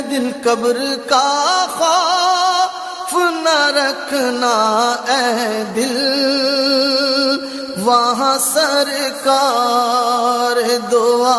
Naat Ringtone

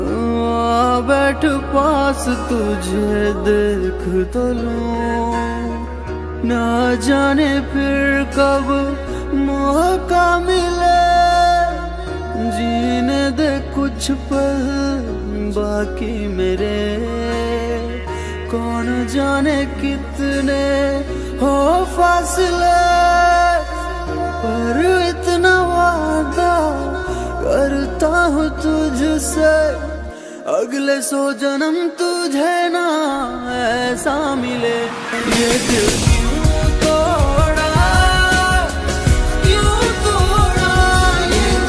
Heart Broken Sad Hindi Song Ringtone

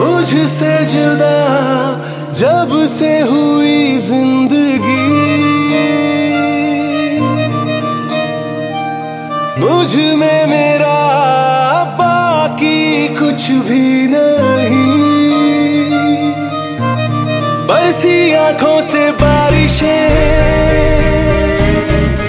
Sad Hindi Song Ringtone

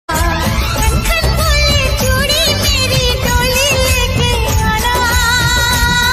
Old Ringtone

